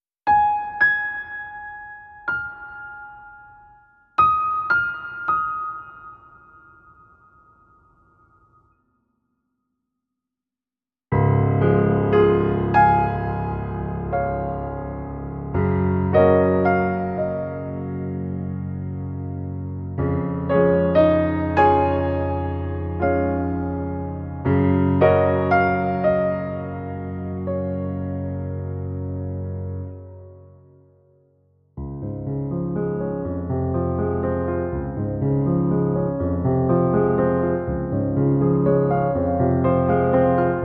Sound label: Clair de Lune, Underscore